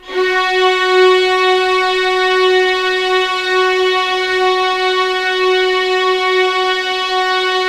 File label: F#4, String Collection; East West; The Ultimate String Collection